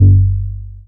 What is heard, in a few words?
G2, BASS; Q Up Arts; Dream Experience; BASS BANK 6